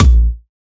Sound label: Kick, Dance Drum Kit